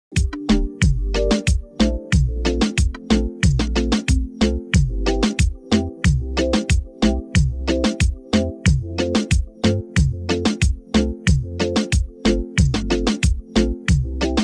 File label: catchy pop beat ringtone